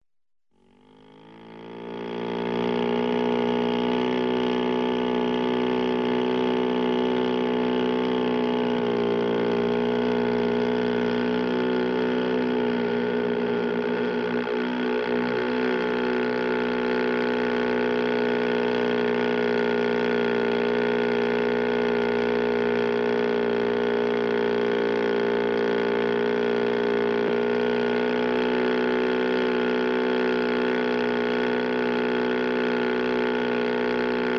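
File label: motor boat 3 hp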